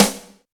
crisp snare sample g key, snare single hit tuned to the G note; snare drum samples